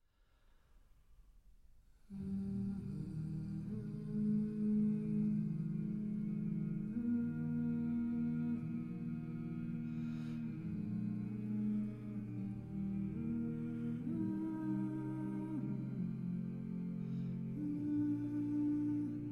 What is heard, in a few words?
Violin and Vocal Ensemble; IX. Nimrod; Arr. Bateman